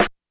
STINKY SNR

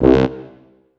Tuba Hit

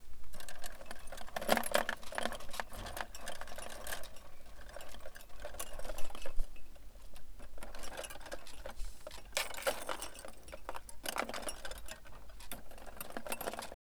jewelry, FX

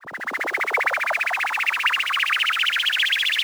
Rise, FX

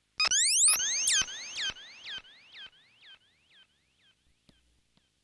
Chirp

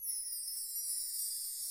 percs

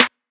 Snare

